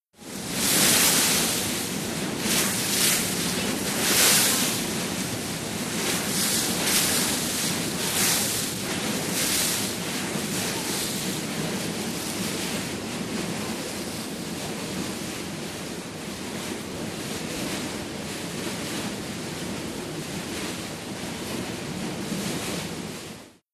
BOATS & SHIPS FERRY: EXT: Bow wash, faint voices in background. Ferry boat.